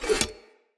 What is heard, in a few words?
Dagger Blade Flip Accent; Weapons; Player; Swords; Fey